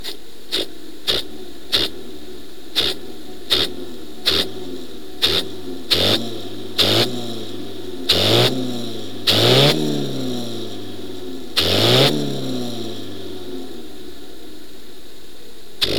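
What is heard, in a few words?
normal 8 before top dead centre mic good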